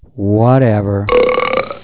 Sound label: burp